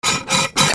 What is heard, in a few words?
KITCHEN